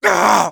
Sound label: pain severe; Sniper